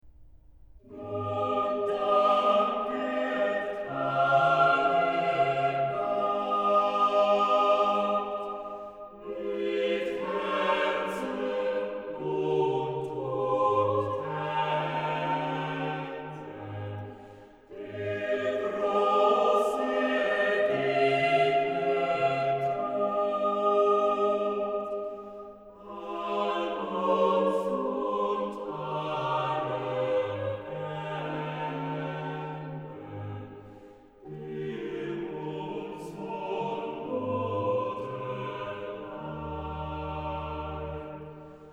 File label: Chorale, organ; BWV0386; Nun danket alle Gott; BACH; BACH ORGAN WORKS; CHORALE PRELUDES